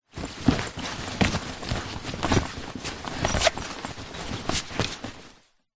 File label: rummage frantic suitcase; foley